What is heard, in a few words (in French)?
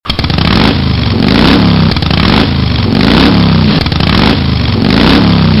Moto démarrage, bruitage Transports